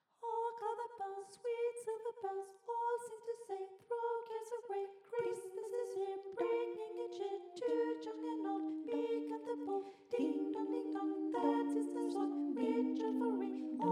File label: Carol of the bells Base